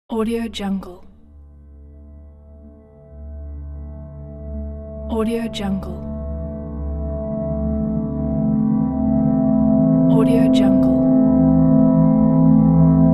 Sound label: forgotten times drone